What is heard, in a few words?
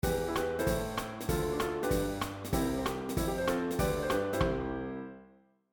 chord seq